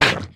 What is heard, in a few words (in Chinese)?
鱿鱼受伤声